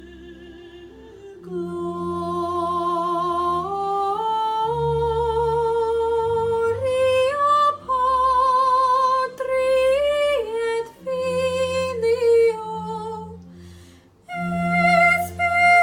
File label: soprano; laudate dominum